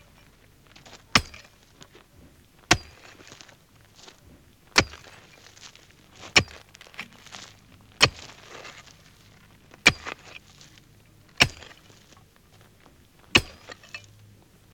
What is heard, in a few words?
Hammering Rocks Multiple